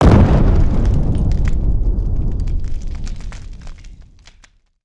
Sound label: Pillar Impact Long